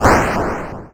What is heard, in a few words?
player die